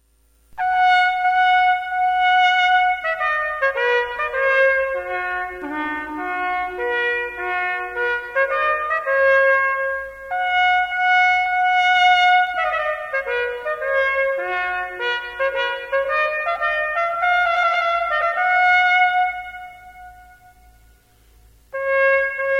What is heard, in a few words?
trumpet tune, GOLDEN FLUTE